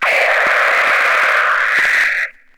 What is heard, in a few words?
robot screech; Zaps and Beeps